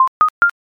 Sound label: loading